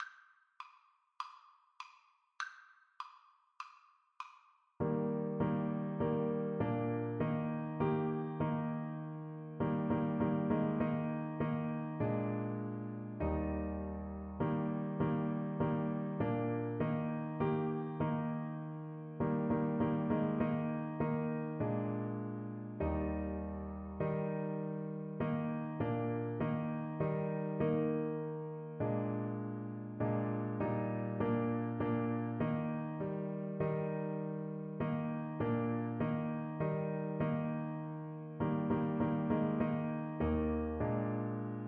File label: blood of jesus, clarinet